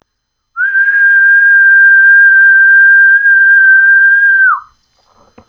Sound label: whistle